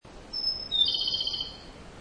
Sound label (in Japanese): tanekoma